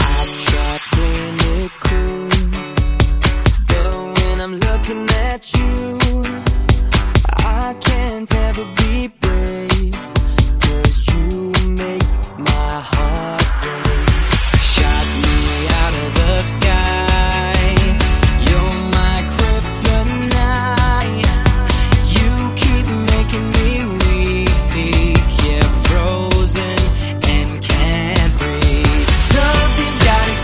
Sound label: REDRUM 130bpm D